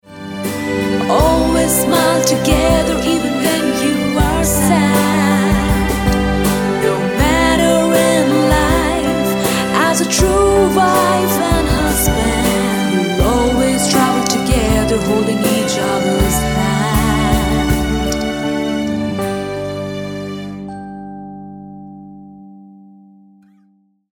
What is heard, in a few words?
ANNIVERSARY TUNE CHILDREN TO PARENTS ENGLISH FEMALE